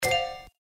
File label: Spin Start; Holy Goat